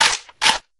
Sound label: chaingun draw; dn3d r